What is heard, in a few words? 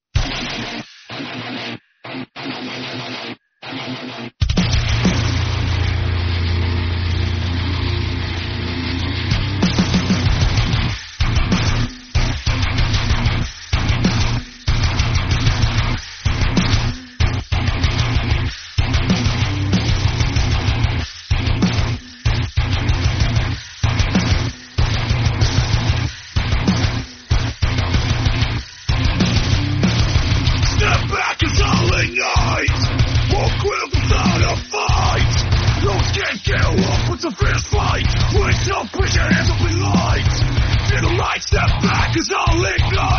metal